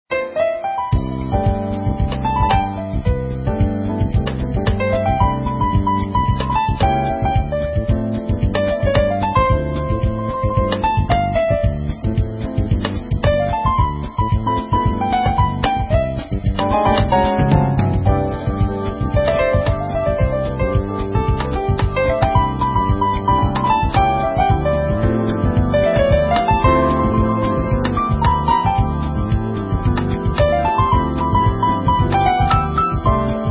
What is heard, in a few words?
samba, jazz ensemble